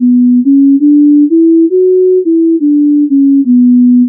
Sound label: timing drift take; practicelens